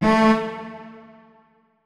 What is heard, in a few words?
notes, keyboard; Hideout Furniture